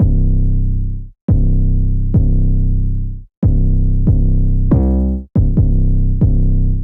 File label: 808 Bass C 70 bpm; Crux